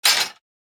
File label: clang; SimplyGladiators